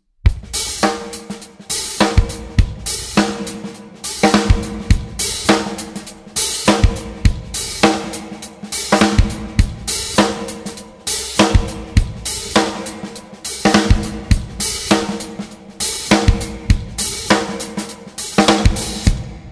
Drumset, cymbal

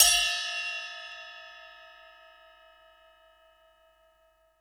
fx break bell Single Hit Edge, cymbal sound; 7" FX Break Bell; zil-bel

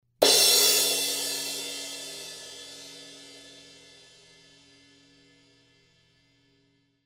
Rock Crash Crash

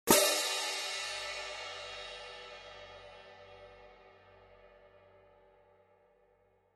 16in Concert Stage Pairs

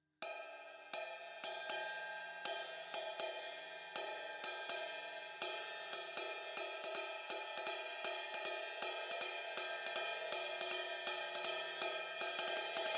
18 I Crash Ride ride pattern, cymbal

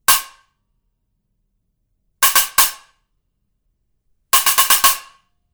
Stacker HiHat, cymbal sound

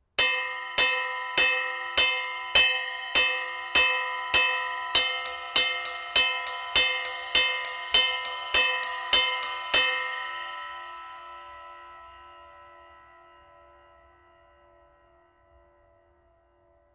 21in Mega Bell Ride, cymbal

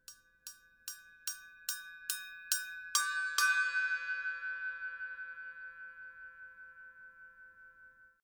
Blast Bell Accent Pattern, cymbal

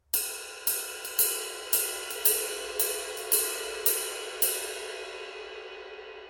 22in Dark Medium Ride, cymbal

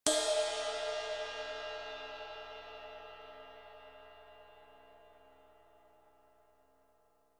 20in Custom Medium Ride, cymbal sound

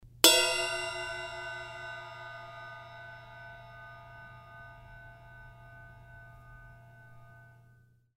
S Medium Ride Bell, cymbal sound

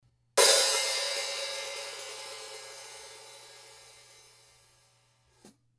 A Avedis Open Hit, cymbal

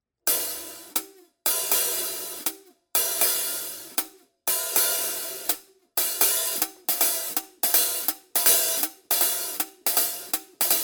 14 I HH open close pattern, cymbal

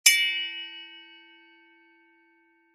FX 9in Zil Bel Large, cymbal

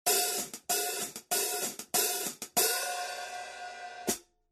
13in Custom HiHats, cymbal sound

